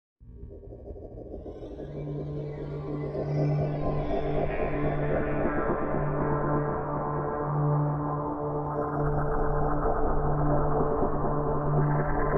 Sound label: game startup; SF; ui